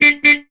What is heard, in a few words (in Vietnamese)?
Am thanh coi xe oto